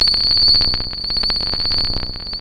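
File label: SYN-FX, synth; E-Lab; X Static Goldmine 1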